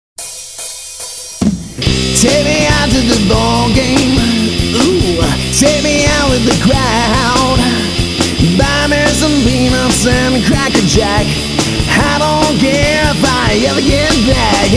Take Me Out to the Ballgame (Rock band); Soundzabound Volume 2 Disc 1

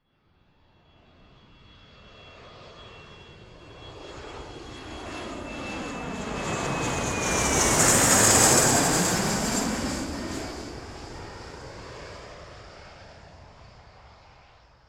Jet

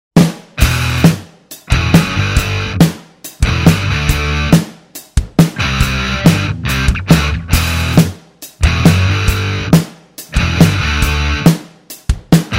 Aussie Rock; Soundzabound Volume 5

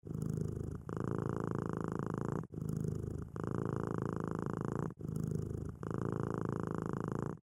cat purr

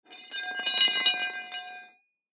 ground scrape

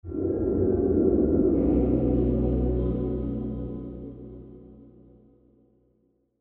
Eerie Force

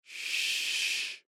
Shhh

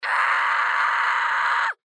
Scream

